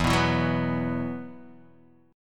E Suspended 2nd, Guitar Open D; 2,2,2,0,2,x

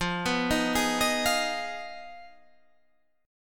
G sharp Minor 6th F

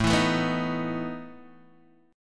E Major 7th Suspended 4th, A

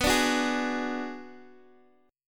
B Minor 6th B, Guitar Open D

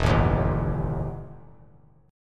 E Minor Major 7th Double Flat 5th E; strummed; JGuitar

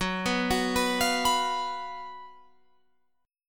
F sharp Major 7th Suspended 4th, Guitar, Open F; F#M7sus4 arpeggiated; 18,18,18,18,20,18

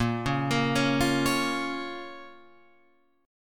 A sharp Minor, Guitar Open F; arpeggiated; JGuitar

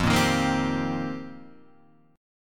C Minor 7th F; Cm7/F strummed; JGuitar

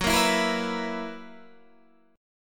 Gb 11th, Guitar, DADGAD

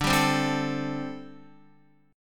D 7th Suspended 4th, down, Guitar

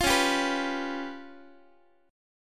C sharp 7th Flat 5th Guitar, chord; C#7b5; strummed; tuning=4A,4E,5A,5D,5G#,6C#

